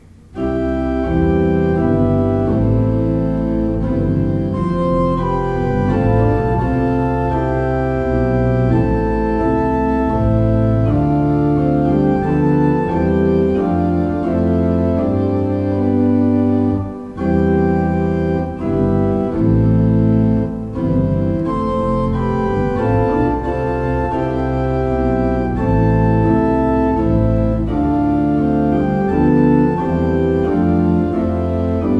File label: loft, pipe organs; EmmanuelCC